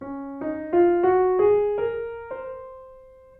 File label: major scale dflat